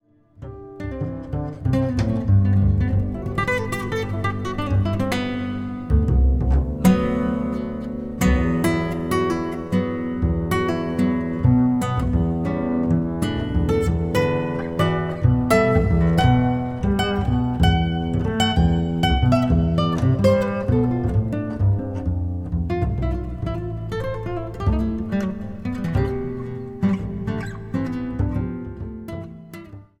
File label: ballad in progress